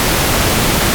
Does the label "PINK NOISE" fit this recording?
Yes